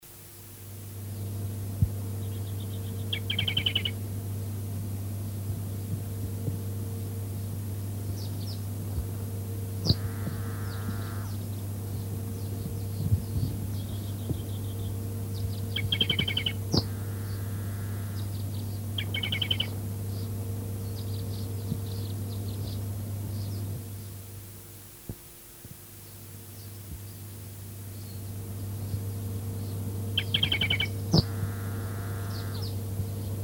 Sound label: woodpecker finch